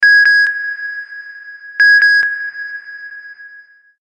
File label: pulse notification